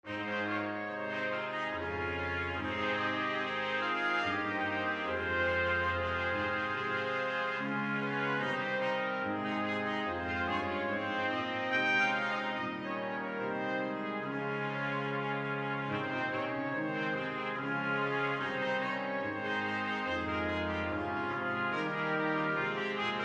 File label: Musings brass ensemble